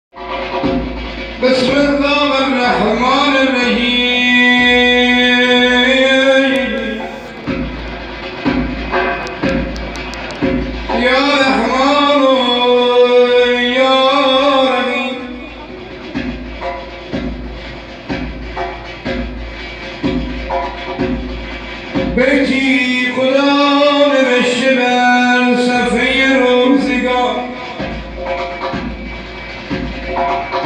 zurkaneh

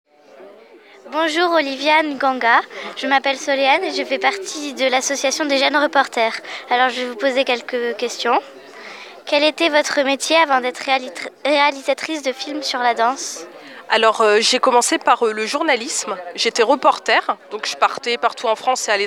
vocal

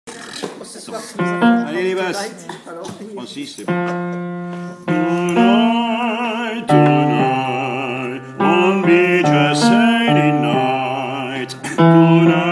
basses

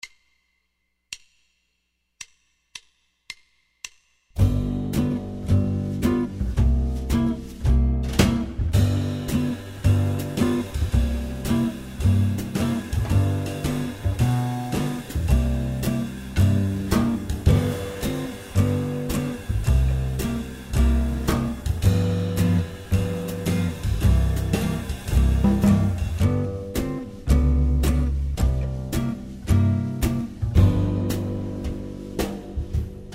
progression G7 C7

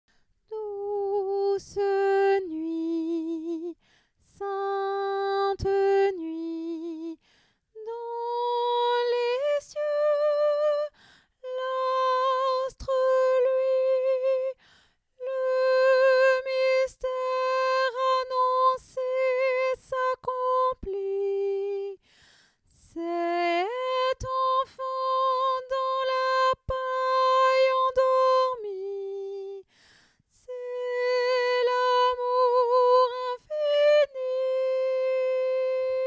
tenor; douce nuit

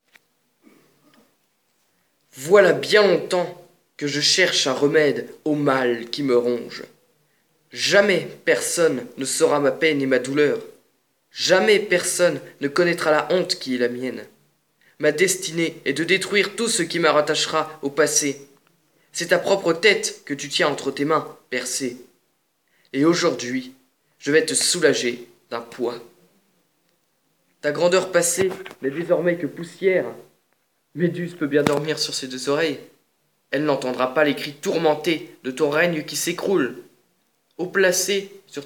vocal

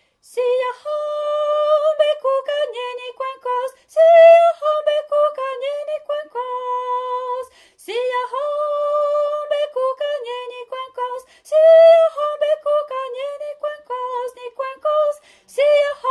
siyahamba tenor

